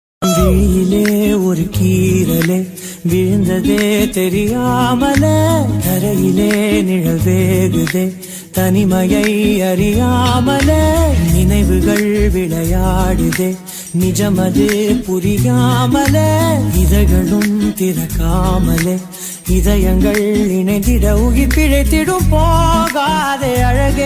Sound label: tamil song; takkar movie song